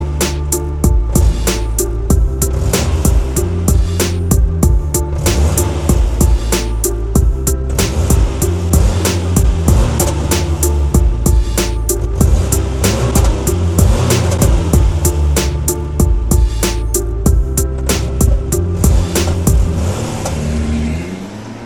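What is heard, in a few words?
car tuning alarm clock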